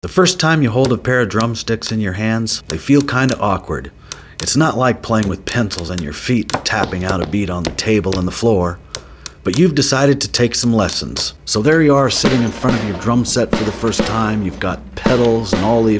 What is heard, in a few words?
FIRST DRUMS